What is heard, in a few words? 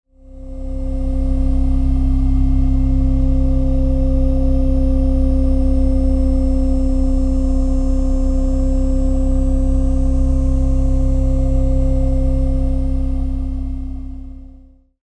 528hz and noise